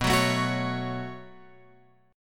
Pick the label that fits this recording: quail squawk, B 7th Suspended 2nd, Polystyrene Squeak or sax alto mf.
B 7th Suspended 2nd